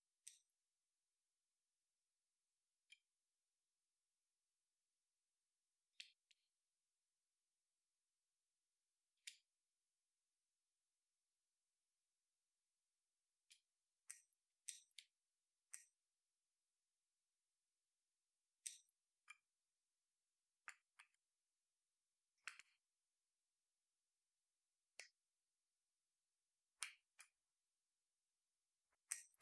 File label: rain